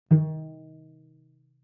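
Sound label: Cello pizz fortissimo sul G Eb4; MIS Pitches - 2014; Strings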